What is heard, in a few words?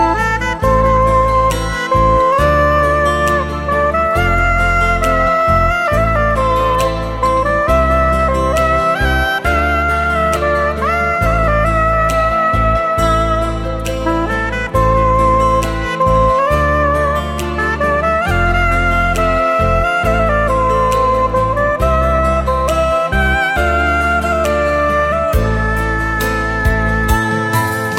Saxophon